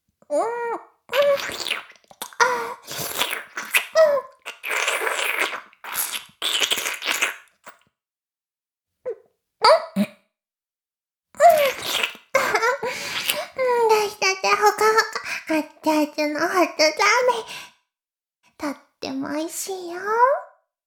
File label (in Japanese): フェラ音声; おにいちゃんアイスぅー！